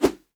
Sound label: SWORD